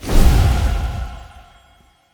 Fire Spell Cast; AudioWizards

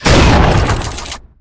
Gun Assault Rifle Power Shot, Weapons

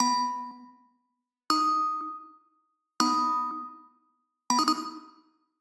Digital Click Neutral

